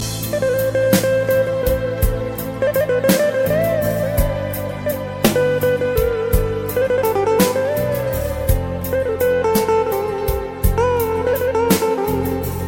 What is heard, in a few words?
Nice Music Armenian